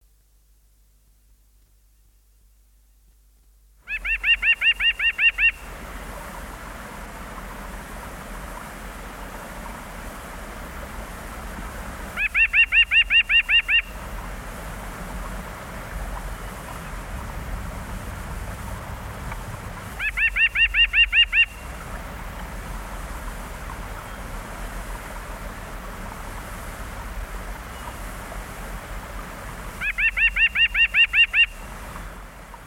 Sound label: white breasted nuthatch, songbirds